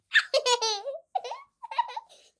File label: laugh, birthday; CosmicRage; CosmicRageSounds